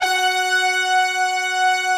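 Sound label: brass tutti, Brass Tutti octave; Optical Media International - Sonic Images Library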